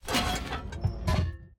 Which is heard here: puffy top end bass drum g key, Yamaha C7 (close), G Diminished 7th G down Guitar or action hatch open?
action hatch open